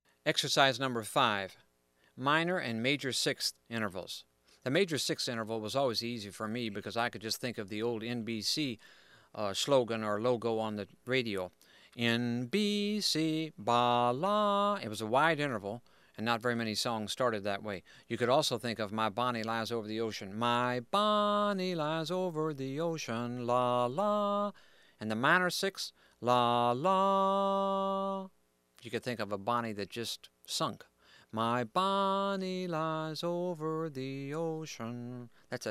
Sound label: Major & Minor 6th Invervals